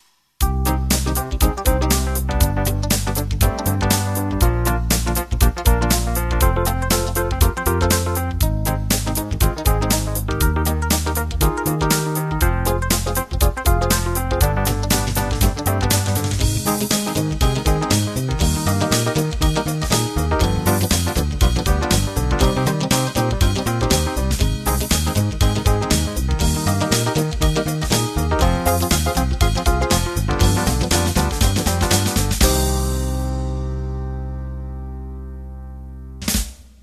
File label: Fusion